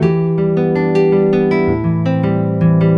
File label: Nylon Guitar; Sonic Foundry (Sony Creative Software); Mac Money; Guitars